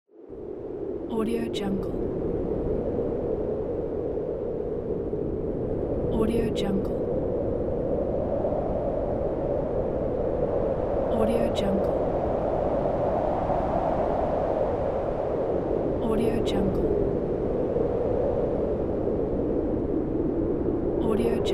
wind

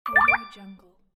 alert

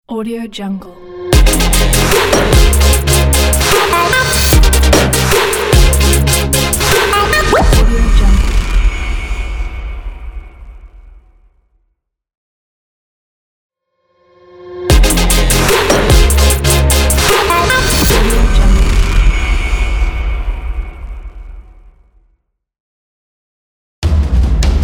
future bass logo pack

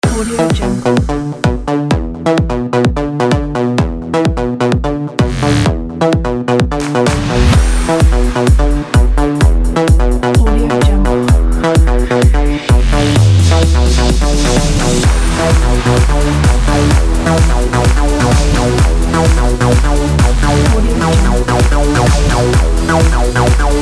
dance logo

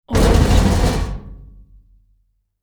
heavy metal hit and rattle

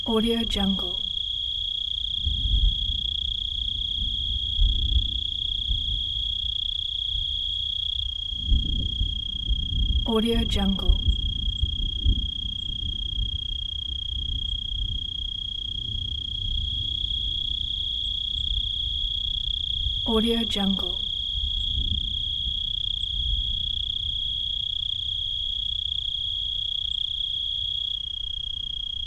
crickets in prairie; shining-wolf

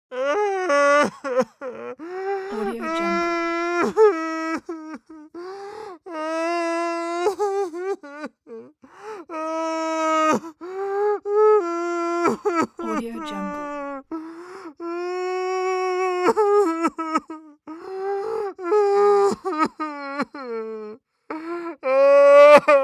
male crying sobbing; pure-noise

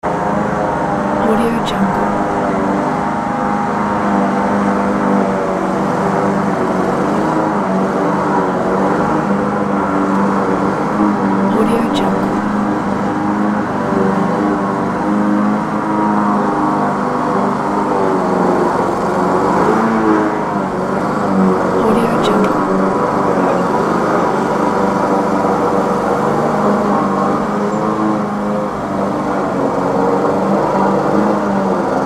lawnmower in the backyard

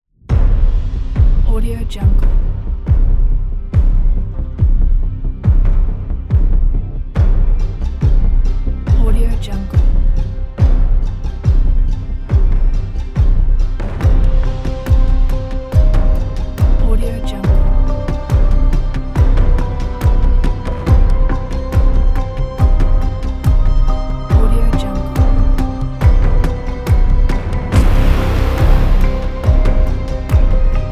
danger scene

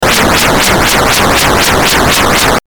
games retro zap pulse

